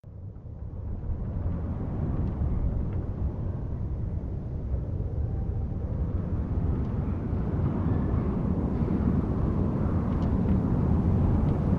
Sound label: Stormy Wind Debris, sandy desert & gusts